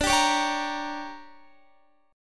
D Major 7th Suspended 4th Sharp 5th, D, down, Guitar; strummed; 4E,4A,5D,5G,5C,6F; chord